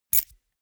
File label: key ring small grab hard